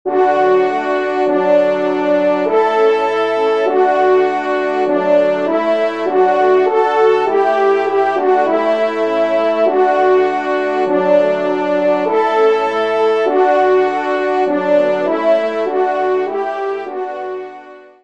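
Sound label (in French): ensemble, trompe cors; Sanctus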